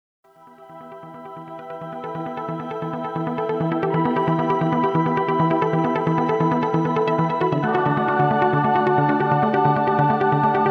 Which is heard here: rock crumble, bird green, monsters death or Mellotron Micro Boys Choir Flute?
Mellotron Micro Boys Choir Flute